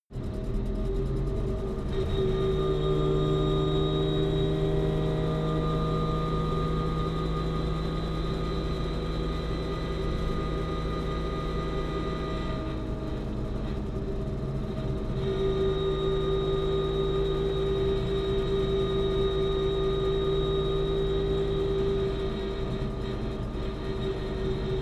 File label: car saab 92 drive low interior